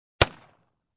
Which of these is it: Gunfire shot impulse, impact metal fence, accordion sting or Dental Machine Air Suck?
Gunfire shot impulse